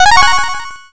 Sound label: level up